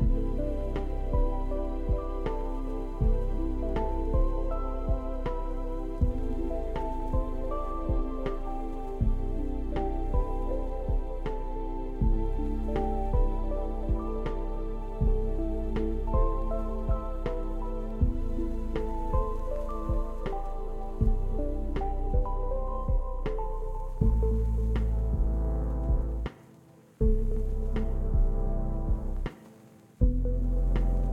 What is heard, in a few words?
Music Ambient Night; Game rip; MiSide